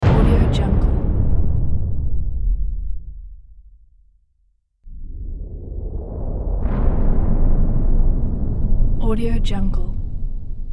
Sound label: epic impacts pack